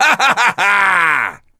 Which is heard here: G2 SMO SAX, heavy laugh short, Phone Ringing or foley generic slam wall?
heavy laugh short